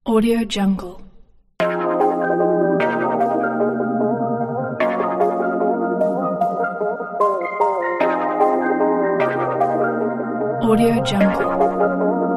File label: modern hip hop; lifestyle